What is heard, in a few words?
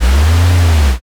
servo